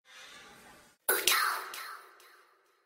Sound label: meep